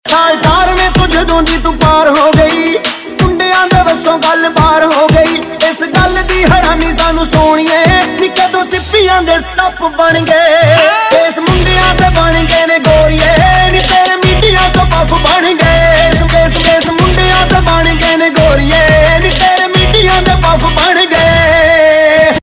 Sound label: Ringtone, Punjabi